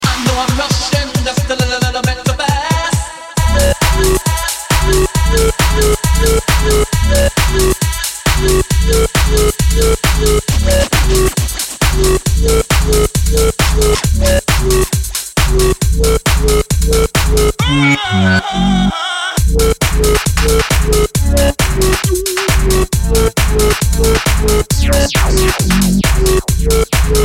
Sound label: bassline mix, Garage; Garage Classics Vol.2